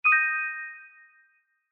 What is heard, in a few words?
Coin Show; The Lucky Fairy